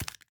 Footstep Run; SFX

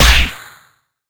Punch short

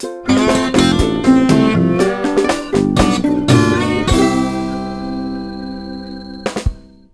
Jingle Good; tamagotchi connection corner shop 2 ds; Kuchi

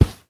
Carpet

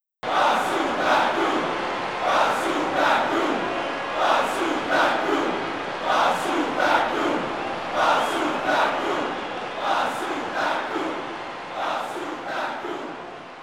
Crowd Chant; mario strikers charged football wii gamerip 2007; Boss Pakkun; Petey Piranha JP

